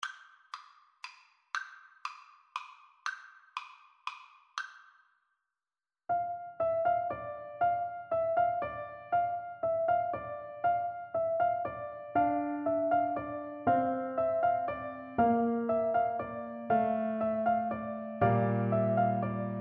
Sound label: carol of the bells, oboe